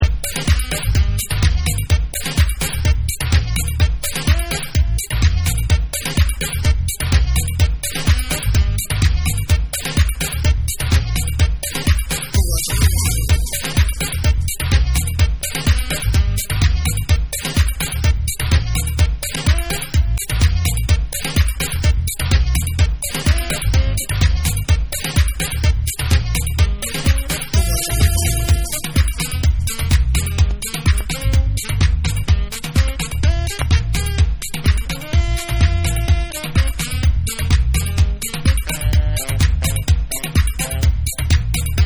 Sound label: Tribal Tongue Mix